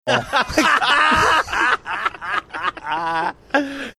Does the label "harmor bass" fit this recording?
No